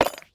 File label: Weapon Crowbar Grab